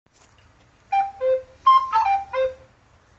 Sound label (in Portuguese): flauta doce; flintstones